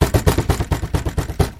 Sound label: rapid punch